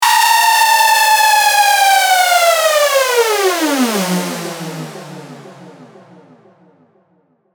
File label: riser siren fall